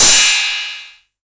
drum crash sample d sharp key, Royality free crash sound sample tuned to the D# note; Cymbal; one shot